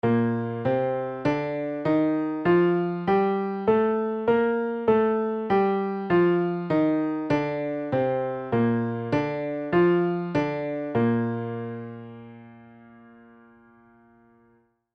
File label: tuneup Bb Major; melodic reading level 4